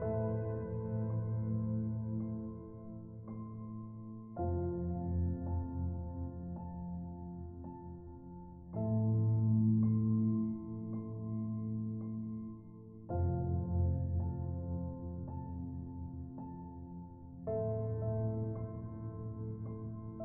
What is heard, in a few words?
Ambient